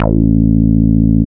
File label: BASS